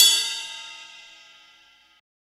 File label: Percs